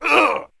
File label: Player Grunt